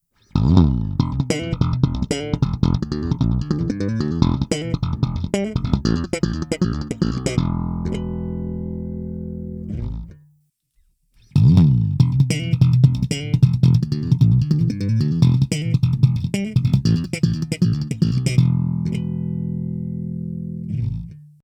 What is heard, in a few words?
Slap Line Speaker